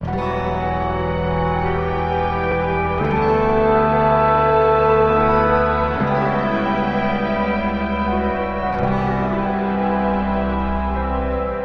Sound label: guitar and strings, Loops, Sad